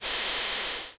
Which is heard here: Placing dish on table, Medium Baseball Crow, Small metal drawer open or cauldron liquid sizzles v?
cauldron liquid sizzles v